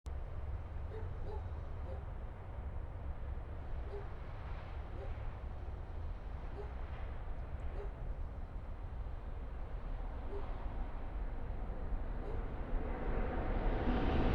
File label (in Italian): Treno; Eigenmike; ComunePR; NuovaStradaF